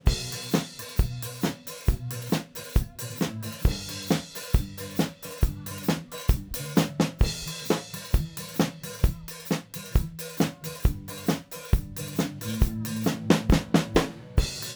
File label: Peer Array Mixing Drums Focus Backdrop